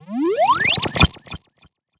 8 Bit Charging

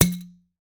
Bottle Pop

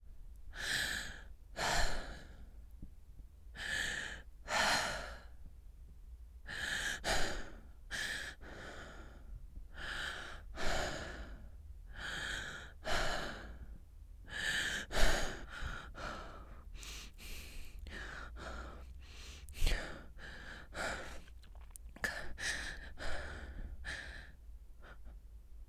Female Breathing Variation

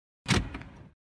Car Shifting Into Drive Automatic Transmission

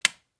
Switch

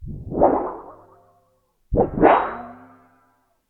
Loud Shimmer Bendies

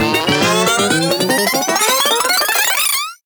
Acceleration Of A Tune

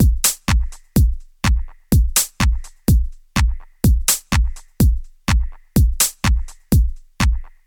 125 Bpm Slow Clap House

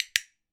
Clicker Clean

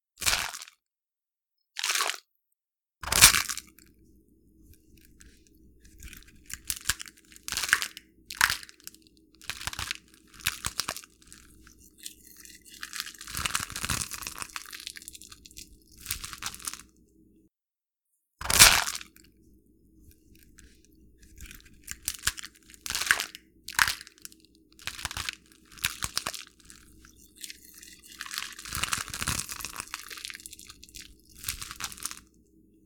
Egg Shells Cracked Mimicking Bone Breaking

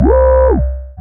Acidic Bleep Tone C2

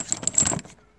Dropping Chain

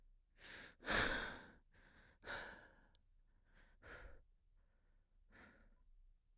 Shaky Breaths

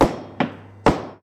Bank Stamp

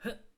Breath Jump

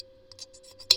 Djembe cheap mic session uno

